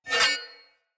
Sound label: Short Sword Whoosh Accent; Weapons; Accents